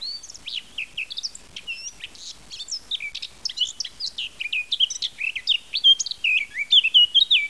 fauvette tete noire, chant

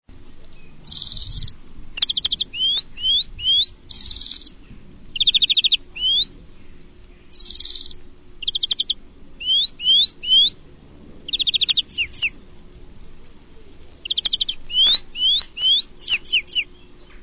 Verdier, chant

